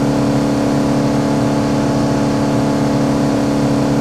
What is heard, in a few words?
69 Mustang Loop, Automobiles